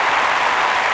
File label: Amb Crowd; Cars; XBOX